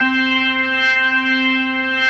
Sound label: C4S, B3